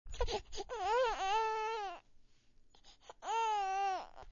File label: whiny baby sound; Southern Maryland Community Forums